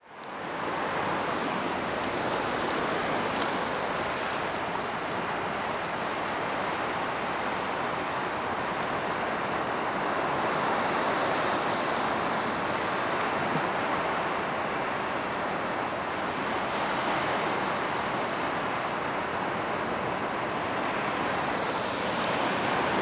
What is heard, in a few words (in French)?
Aqua, Paysage sonore